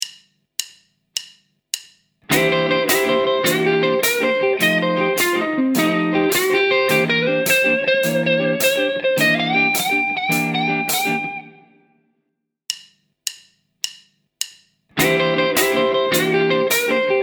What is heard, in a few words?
texad lead